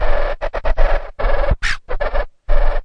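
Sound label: turntable transform; Scratching and Turntablism; DRUM LOOPS and BREAKS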